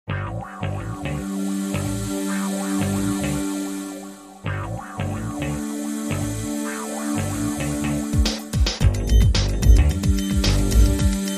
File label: Funky